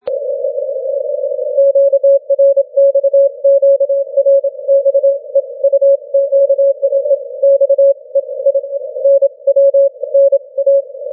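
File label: 17m continuous wave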